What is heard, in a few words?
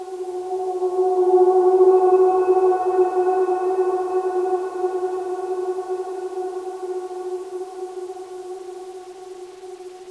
strange; cstrike